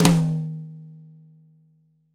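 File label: New Jazz Tom High Flam, Roland BK-5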